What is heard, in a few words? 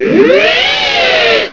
uiiiii